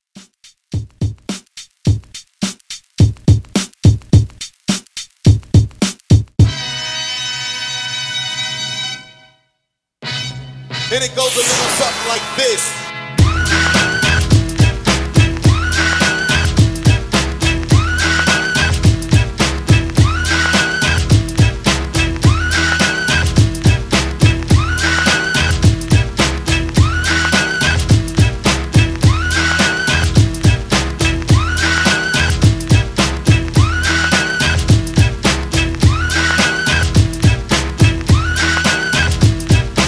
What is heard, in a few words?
90s Extended, 106Bpm; Redrum